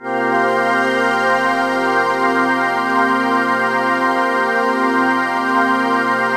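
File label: chord pad, Pads; Best Service; XX Large - Pads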